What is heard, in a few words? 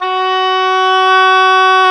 oboe, mf f; Roland L-CDX-03 Disk 1; Oboe 2&3